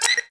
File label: bat hurt; GONNER